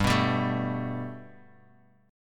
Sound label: G Major Add 9th Guitar Open F, chord; 7,6,7,4,x,x; Gadd9 strummed